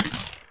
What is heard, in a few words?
WET PERC